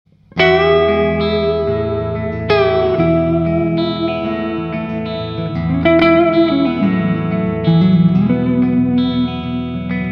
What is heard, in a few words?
GIBSON DUETTO